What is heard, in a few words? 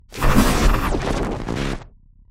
Download, sound effect